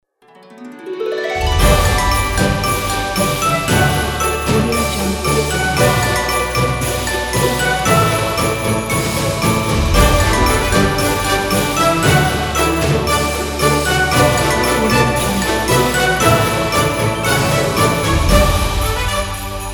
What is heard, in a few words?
christmas logo